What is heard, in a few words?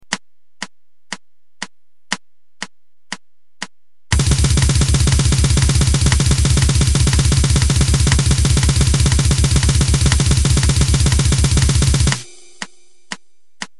blast